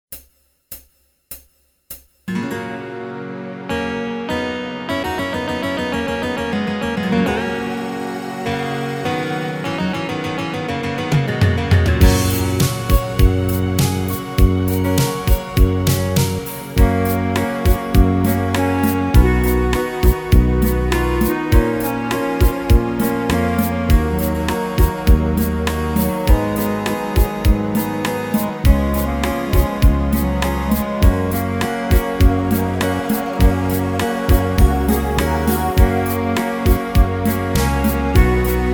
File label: Korg PA800